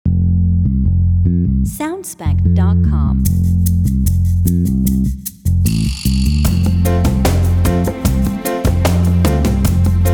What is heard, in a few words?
Just Reggae, Reggae groove music track with intro in bass